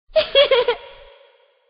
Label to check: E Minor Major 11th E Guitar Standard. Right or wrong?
Wrong